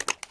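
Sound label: shell in, Ithaca M37 shotgun; sg-ithacam37; izanagi 2022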